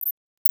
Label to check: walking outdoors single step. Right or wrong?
Wrong